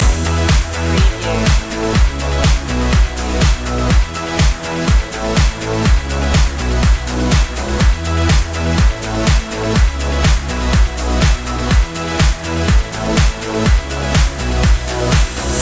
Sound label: creamsicle loop